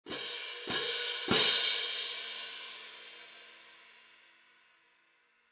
Artisan Traditional Symphonic Medium Light, sabian; 18"